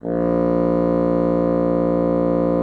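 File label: bassoon mezzo A#1; Xsample VOL-6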